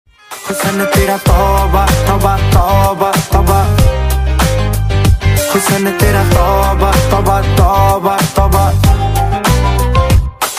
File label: song ringtone